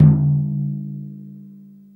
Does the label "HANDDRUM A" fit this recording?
Yes